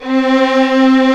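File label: strings, 8pc, vibrato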